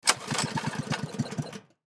Go Kart Start Up, Go Kart Start Up Pull String Rumble Stall; Go-Carts